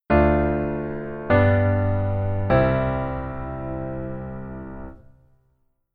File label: keyboard voicing low